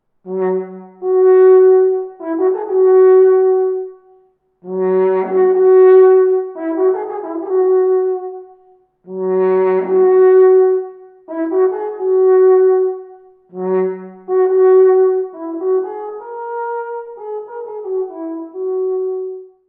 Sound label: Alphorn